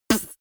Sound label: beatbox snare punchy